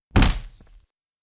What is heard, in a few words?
fighting double kick; soundscrate